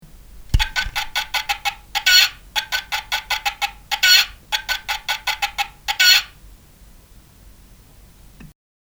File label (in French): poule noire bruit